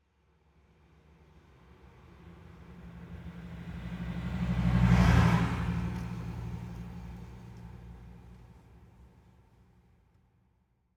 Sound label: int car slow pass by; aXLsound; Urban Passes By